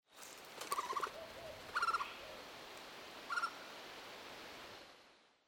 pic flamboyant, chant

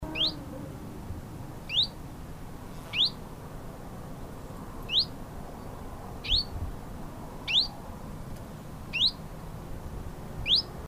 pouillot veloce

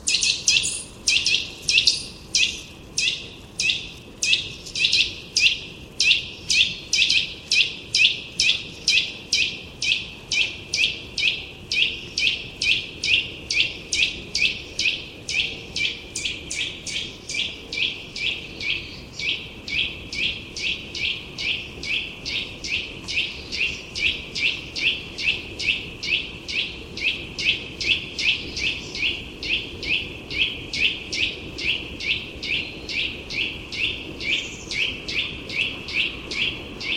sittelle torchepot; chant

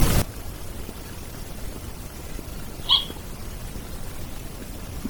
egothele d australie

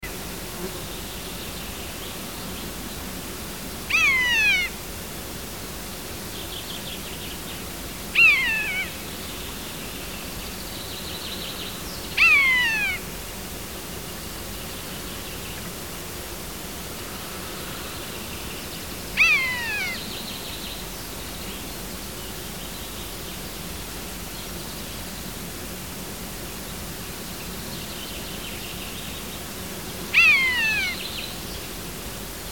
buse variable, chant

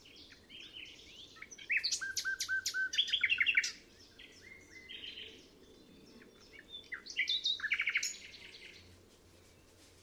rossignol philomele; chant

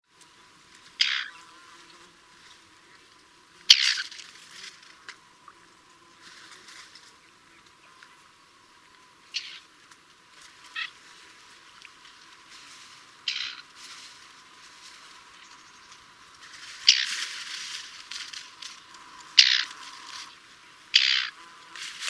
rousserolle effarvatte

